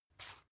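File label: toothpaste close twist, Bathroom; haus-garten; badezimmer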